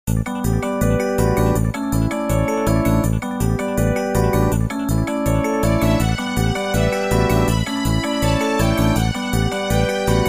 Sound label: egg bgm; Brought Up My Idol Pretty Training Game; Chronus App Inc; android